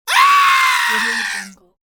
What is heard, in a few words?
male terror scream